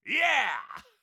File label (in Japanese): イェア！